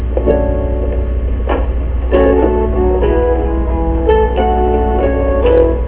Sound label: Closing Riff